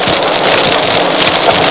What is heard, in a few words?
FIRESPIT